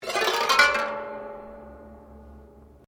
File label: HAMMER DULCIMER EFFECT STRUM UP, Old Chinese Hammer Dulcimer; Strings (Sound Effects:Musical)